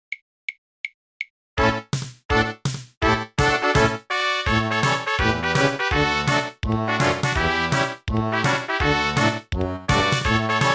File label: midi